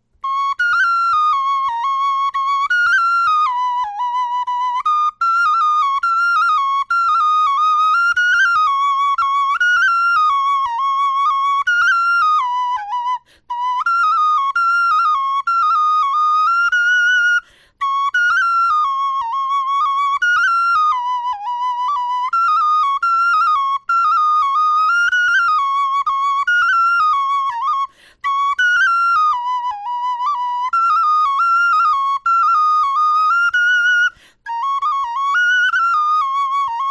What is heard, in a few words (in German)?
melodie, knochenfloeten